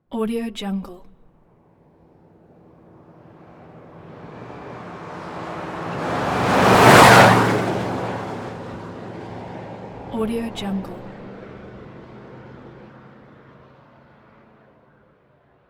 sport utility truck very fast by with acceleration; hollywoodedge